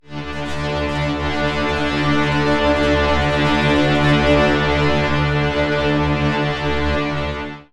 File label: Synth Transition